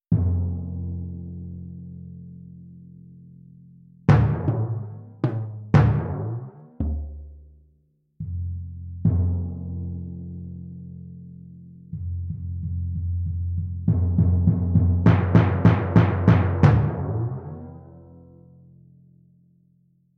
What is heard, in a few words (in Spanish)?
timbales orquesta; ecoescuela